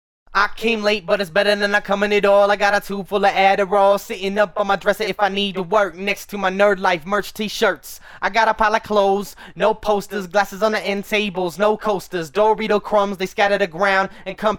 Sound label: acapella